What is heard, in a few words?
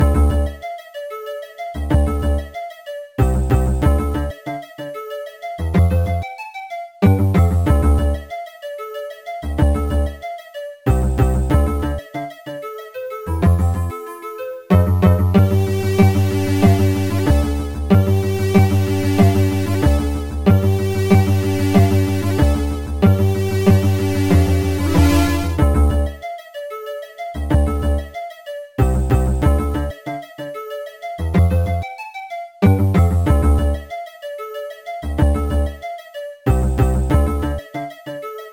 Elf (forest), music